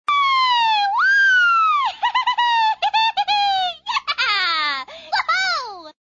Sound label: happy girl